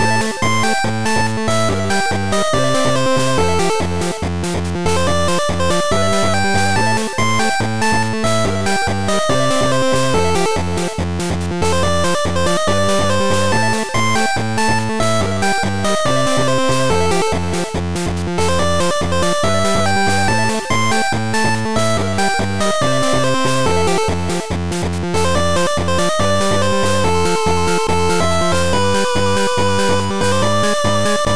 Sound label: 8bit Jersey Club Man